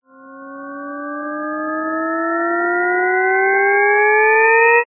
cartoon grow expand

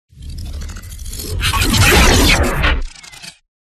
WARPEDMECH; PMSFX

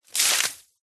nature water pour onto concrete

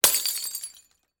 Glass Smash Jar; noise creations

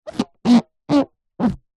leisure toy inflatable hammer rub squeak

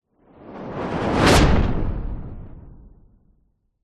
sound design whoosh fire like airy

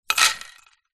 animals dog leash metal chain set down wood floor

